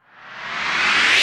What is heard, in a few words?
Sweeps Short